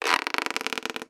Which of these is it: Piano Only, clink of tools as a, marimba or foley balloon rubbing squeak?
foley balloon rubbing squeak